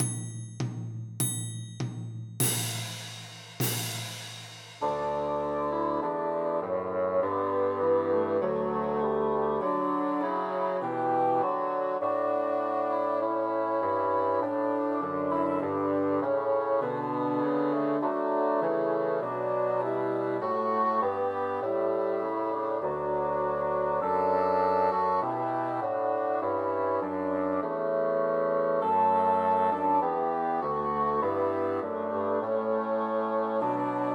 See Amid the Winters Snow Christmas Trios for Three Bassoons